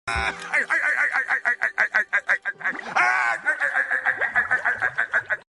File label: laugh long; mr krabs